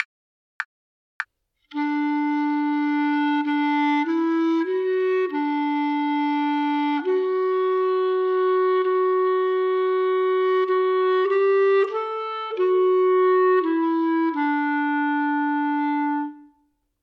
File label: partially completed melodic dictation